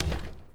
Street Ball Glass Back; SFX Kit

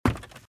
Footsteps - Wood - Creaky - Run - Left

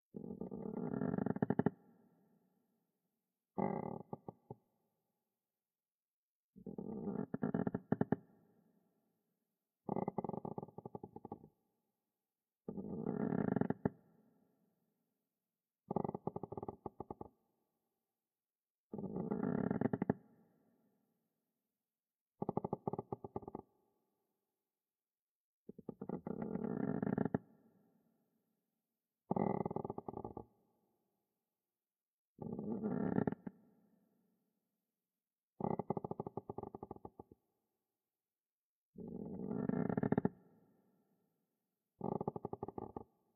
AMB Sea Captain Room

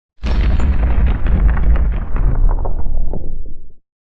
Explosion Low Fire Destruction; Hollywood Edge; sound designer's toolkit v2

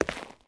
zombie footstep concrete; DeadFright

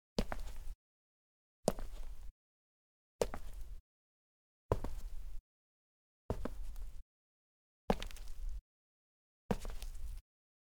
Cement Clean Sneakers, Foley Footsteps; Sound Ideas

